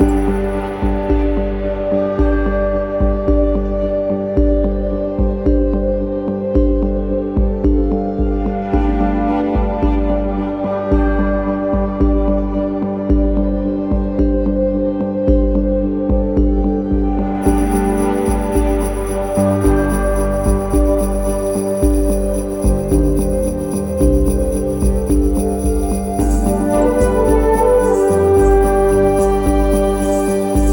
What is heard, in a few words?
Winter Ambient Day Loop